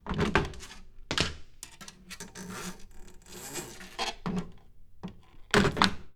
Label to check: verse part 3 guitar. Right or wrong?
Wrong